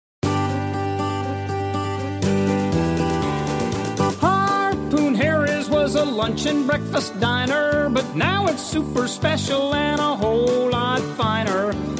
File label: Main Jingle; Harpoon Harrys